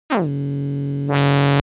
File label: charged laser shot; game fx; smartsoundfx